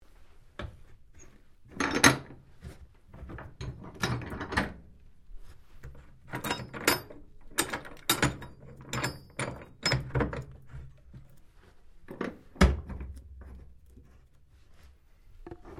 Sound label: PORTHOLE SEALS, Sounds onboard HMAS Castlemaine; Boats; Vehicles